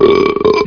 Belch; MacVerter Metric 2.3

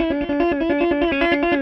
Blues Trill, Guitars; ACID MUSIC

